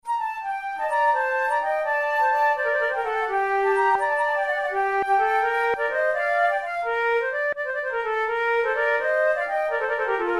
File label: for two flutes gigue en rondeau; Monteclair; 6 concerts; flute tunes